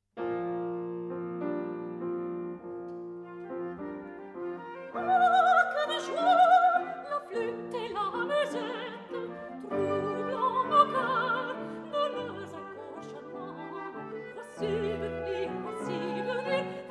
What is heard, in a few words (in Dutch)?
sopraan; Harold King; NCRV studio